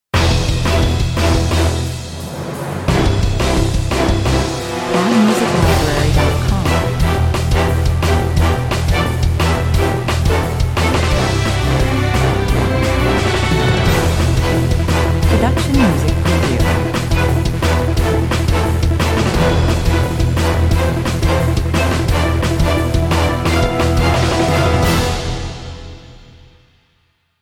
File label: action cues heroes might 135 bpm